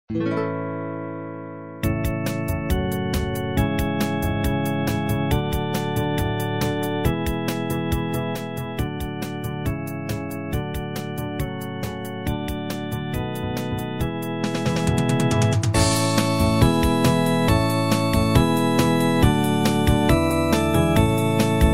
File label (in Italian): solo musica